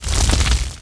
fire, magic; The Elder Scrolls III - Morrowind; Morrowind GOTY GOG

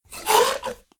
Ghoul Growl; SyrinScape; East Ward

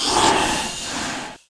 moan; The Elder Scrolls III - Morrowind

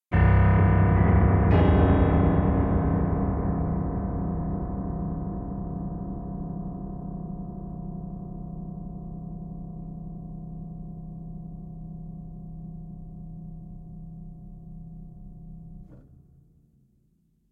piano notes; SyrinScape; Madness in the library

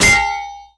shield; The Elder Scrolls III - Morrowind; Morrowind GOTY GOG

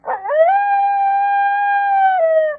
wolf howl; The Elder Scrolls III - Morrowind

